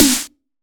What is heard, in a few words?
Dubstep Snare